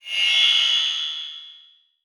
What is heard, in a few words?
Multimedia Internet CD-Rom Flash Wipe